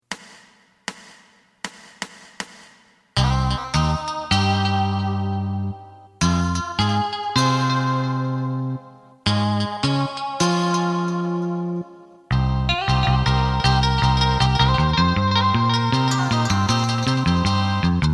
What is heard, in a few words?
drum